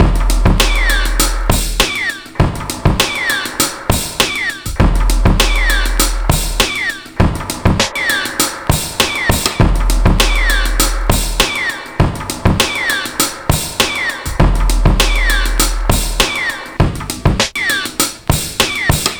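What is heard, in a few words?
drum, 100bpm, Breakbeat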